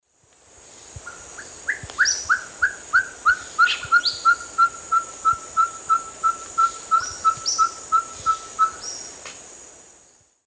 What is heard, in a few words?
Glaucidium brasilianum, song; S. America; Eastern Lowlands